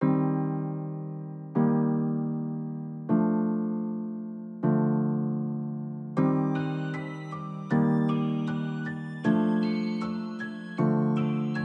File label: 156 bpm